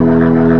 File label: E2, B3 ORGANS; Greytsounds VOL-1